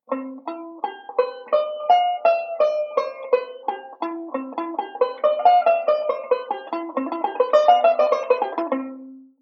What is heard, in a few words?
Modal Speed Drill, banjo; Beyond Chord Melody Volume II